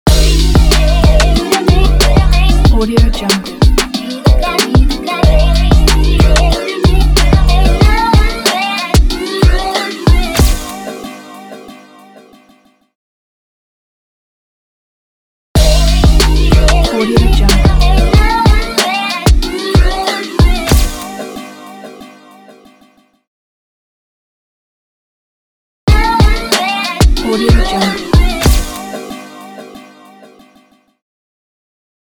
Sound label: umptempo hiphop logo pack